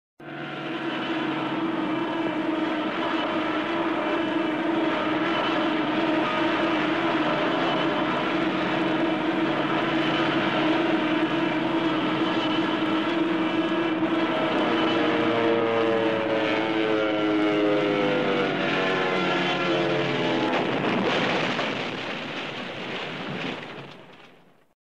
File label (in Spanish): Avión caída accidente efecto de sonido